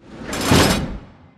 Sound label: Loading Transition Ending; Food Truck Game